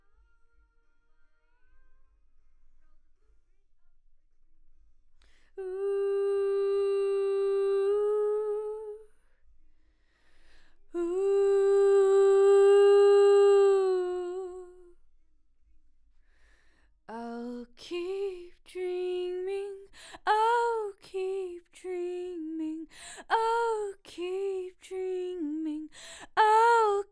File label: Vox start new